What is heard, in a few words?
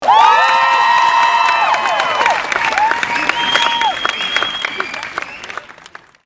Cheers